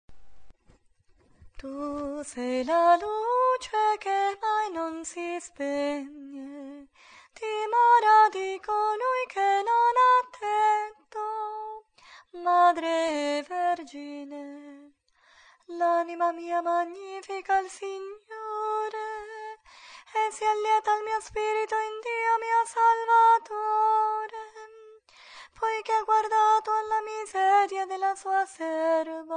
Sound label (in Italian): Magnificat - Contralti e Bassi